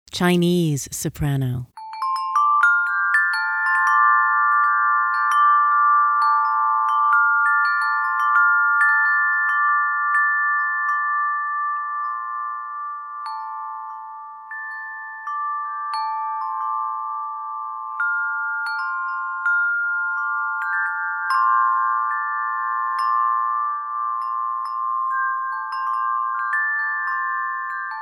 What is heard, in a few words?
Chinese Soprano